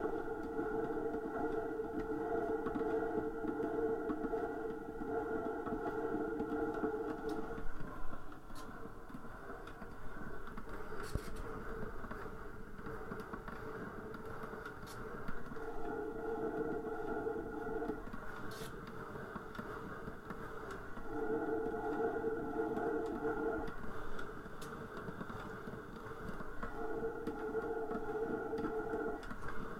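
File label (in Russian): скейтбординг на стоянке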